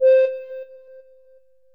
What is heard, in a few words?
aero lead